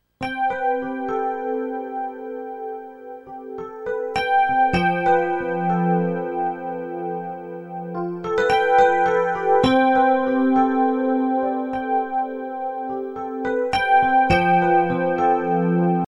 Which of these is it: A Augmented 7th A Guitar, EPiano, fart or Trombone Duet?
EPiano